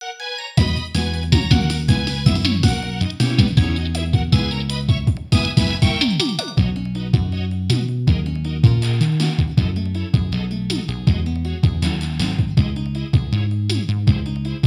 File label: Café - (Instrumental)